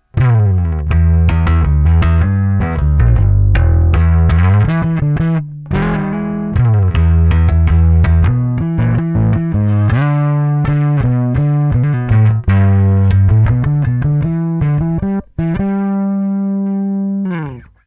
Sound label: Preci Overdrive Amp DI post EQ